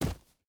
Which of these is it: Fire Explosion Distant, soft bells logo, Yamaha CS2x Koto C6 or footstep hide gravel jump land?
footstep hide gravel jump land